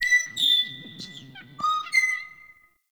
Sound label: Forest Bird Mid; Ambience